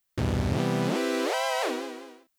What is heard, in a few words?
chip synth